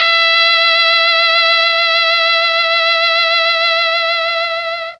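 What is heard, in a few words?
E4 trombone trumpet, mellotron; TROMB+TRMPT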